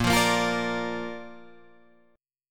A sharp Major, Guitar, Drop D

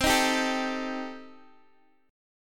C Minor 6th Guitar Open G, chord

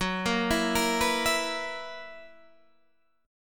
Gb 11th, Guitar, DADGAD

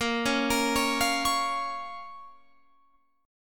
A sharp Minor, Guitar Open F; 22,20,22,20,20,20; arpeggiated

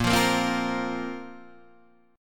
A sharp Suspended 2nd; A#sus2 Chord {6 8 8 5 6 6} strummed

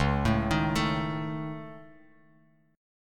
Db Suspended 2nd Sharp 5th, Guitar Open F, chord; arpeggiated; Dbsus2#5; JGuitar

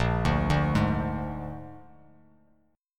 A sharp 7th A sharp, Bass Standard; A#7 arpeggiated; JGuitar

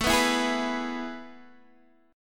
A Major 7th Suspended 2nd, Guitar, Open D; chord

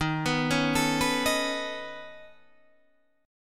Db 7th Suspended 2nd Sharp 5th Eb, chord shape

